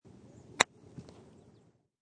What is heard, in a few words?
Pitching Wedge Shot, High quality stereo recording of a pitching wedge shot.; Golf; Sports